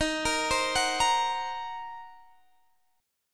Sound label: Eb Minor 6th, Guitar Open D